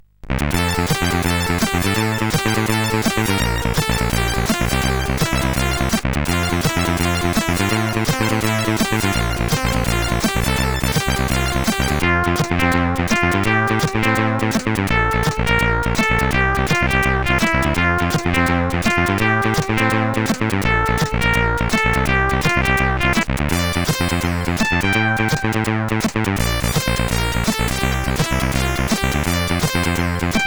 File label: Clik Clak, CSG8580R5